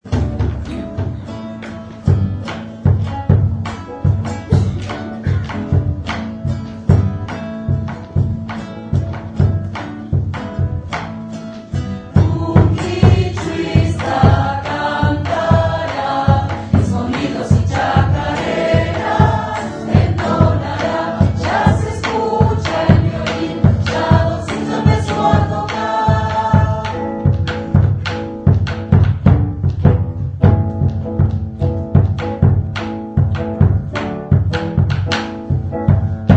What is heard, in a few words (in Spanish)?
coro; orquesta municipal